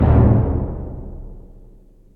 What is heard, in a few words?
B Drum